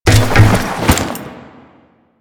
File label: APC Mount Foley; Enemies; Lasrian; Warframe